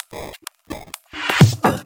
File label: Electro Loop 128 BPM; Vengeance Samples